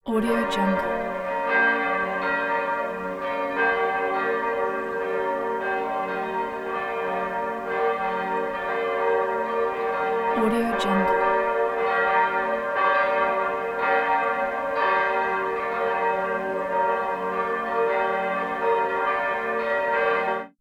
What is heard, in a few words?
church bells